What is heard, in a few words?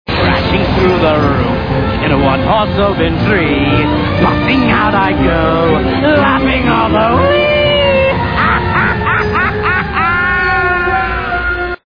jingle